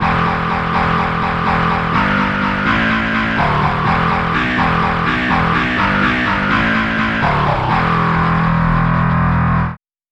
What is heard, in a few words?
overdrive guitar bass